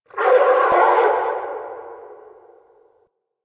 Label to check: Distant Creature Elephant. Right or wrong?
Right